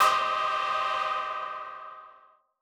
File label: M Dynamic Tech C4; KG MegaSaur